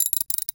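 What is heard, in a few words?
Ratchet; 1001 Sound Effects; Tools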